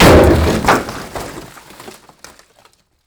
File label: peugeot 106 dropped 5m on metal plates, Car Debris, Impacts & Crashes; zaxcom holophone; Pole Position